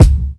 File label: smooth kick one shot c key